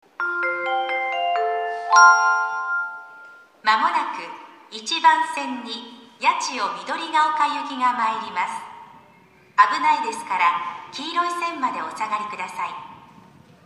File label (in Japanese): 駅放送, 1番線 接近; 2014年2月26日頃より接近メロディ付きの新放送に切り替わりました。; funabashi-nichidaimae